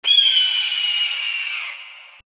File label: bird hit